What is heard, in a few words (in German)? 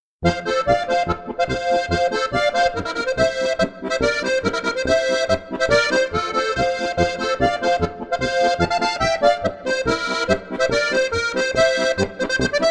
granaten polka